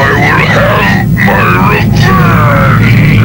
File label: death; opentdm; bruticus